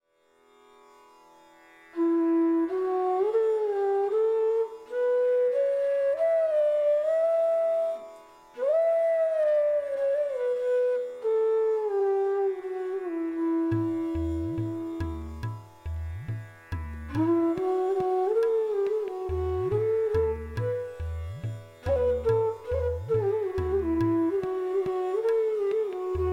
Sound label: Raga Kafi Flute; Bansuri Student Zone